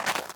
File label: Snow